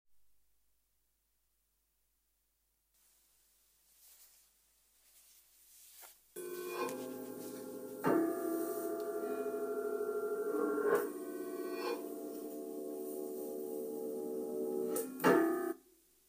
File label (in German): Flageolett Reverse